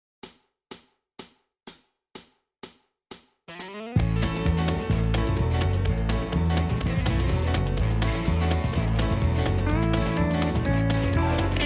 Country minus drums

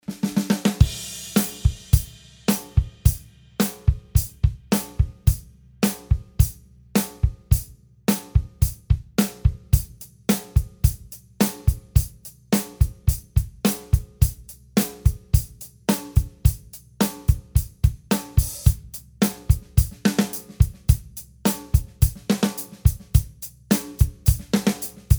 Esprit Elite

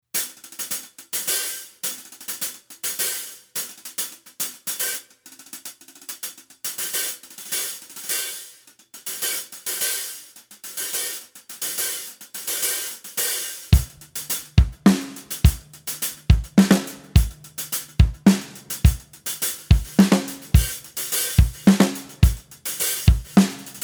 TRX CRX Cymbals Classic and Xtreme hihats

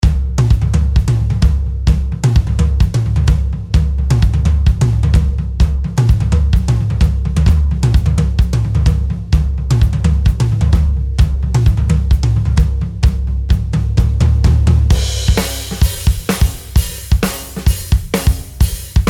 Mapex Saturn SE drumset

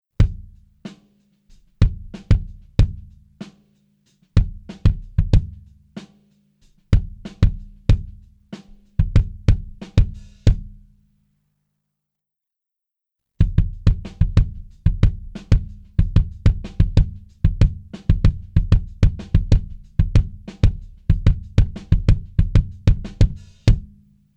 red kick only; Rogers

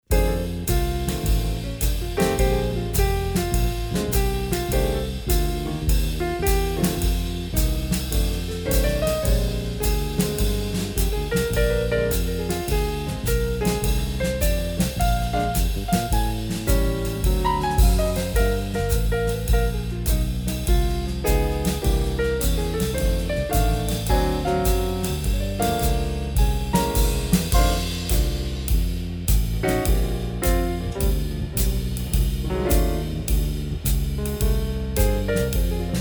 Soultone

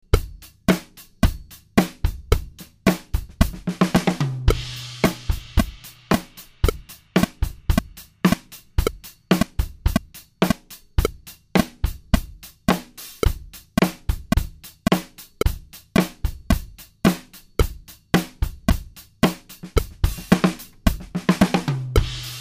click not Quantized

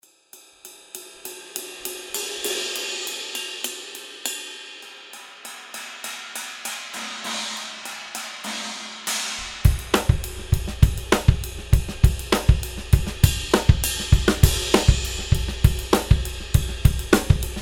Meinl Byzance Raw Bell

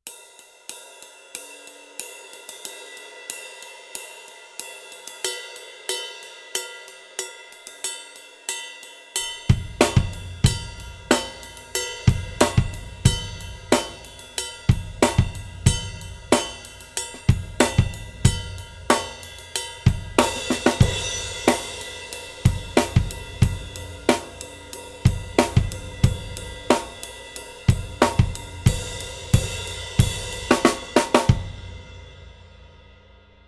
Stadium ride

